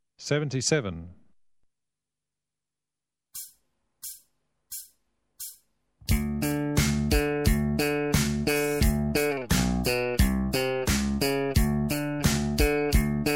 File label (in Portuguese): tercinas slap; baixo